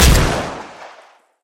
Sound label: mosin, guns